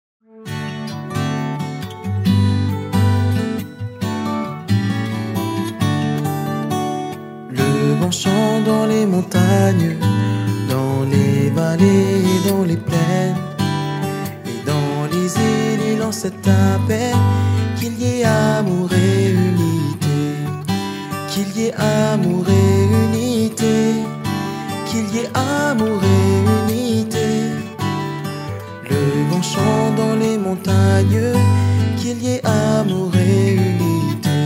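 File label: Song, French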